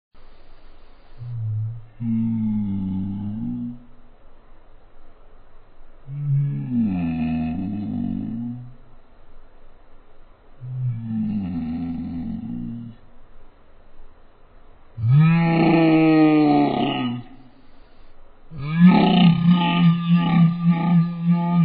WOAH

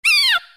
despair

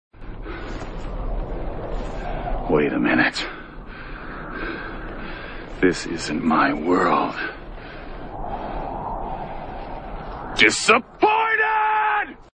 disappointed

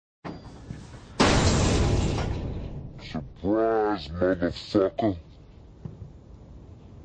surprise

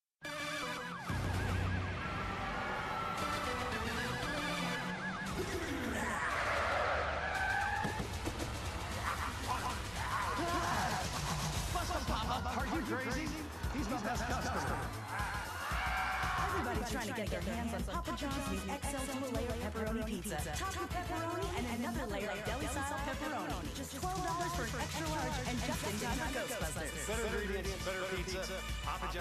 scream

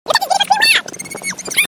squirrel

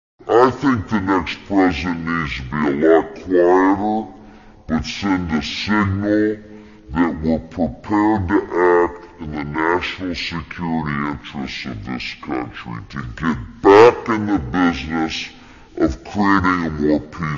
please clap

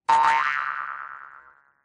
spring

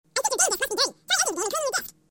dirty disc

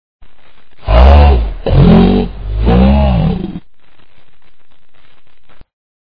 mail

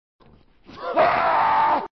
HAH

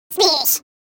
sploosh

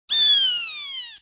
ahh in japan

